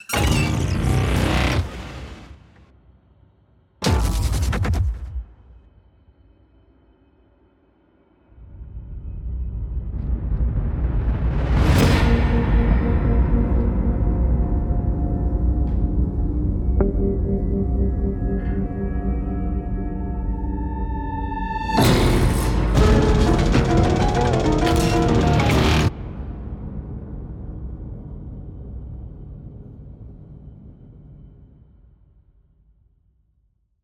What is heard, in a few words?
stinger x 5